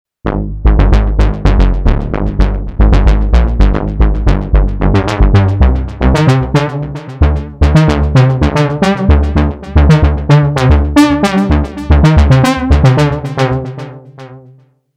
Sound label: What a delicate bass